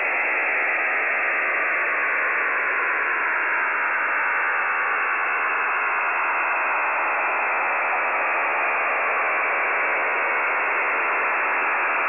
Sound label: Short Wave Radio Noise